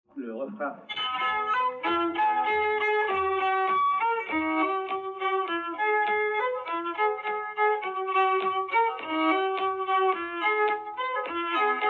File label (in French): afeul la loutre violon et commentaires